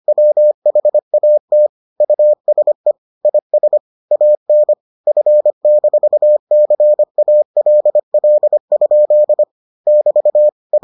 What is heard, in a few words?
morse